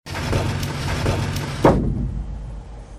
Bonus Door; slots; District Samurai

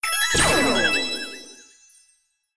Jackpot Normal; slots; Robot Vs Monster

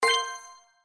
Bonus Click, slots; Genies Wild

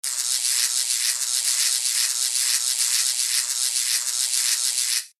SFX Anticipation, SLOTS; Pinata Loco

